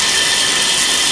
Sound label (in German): Sound Wasser Neu